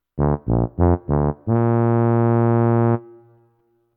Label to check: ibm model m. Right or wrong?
Wrong